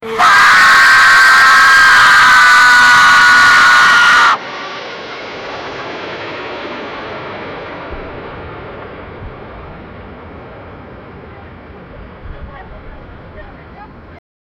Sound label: screams of passengers on, aircraft